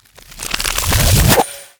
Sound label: Ice Spell Power Up Time Manipulation; Power-Ups; Arctic Aura